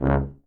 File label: C#2 TUBA